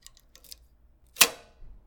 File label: unlock ring lock